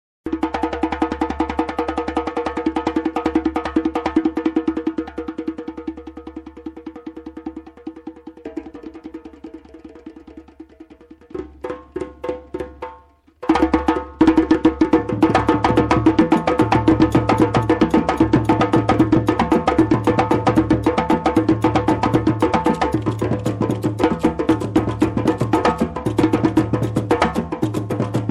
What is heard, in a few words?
djembe doum; afrique